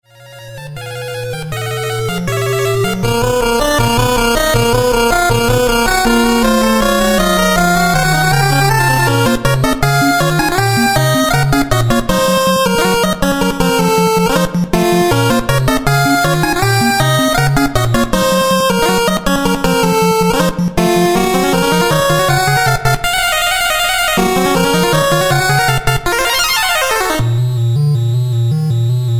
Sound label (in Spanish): tono nes battle fanfarria; final fantasy ii